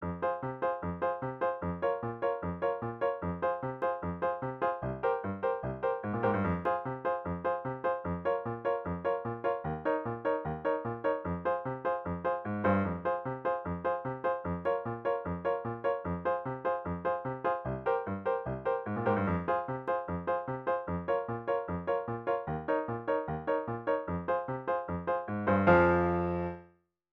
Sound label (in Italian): anni '40